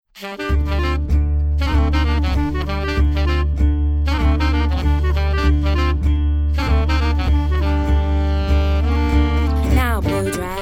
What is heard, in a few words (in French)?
Config de swing